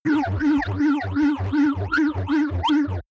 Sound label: fast pulsing wobble synth